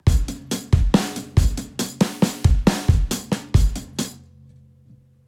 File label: 68 BPM